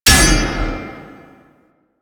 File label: Parry Sword; Warframe; Teshin; Weapons